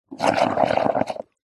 nature water blowing air bubbles in bowl of water foam short burst